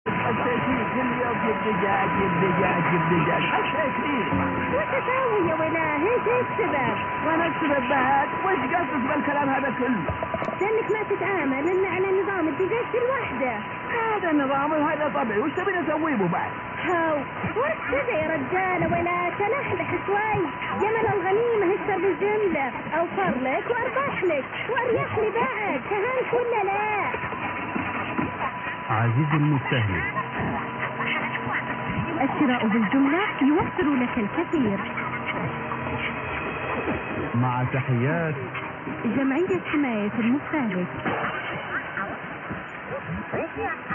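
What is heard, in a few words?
Saudi Arabia, radio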